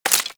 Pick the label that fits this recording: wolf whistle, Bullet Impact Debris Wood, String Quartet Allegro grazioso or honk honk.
Bullet Impact Debris Wood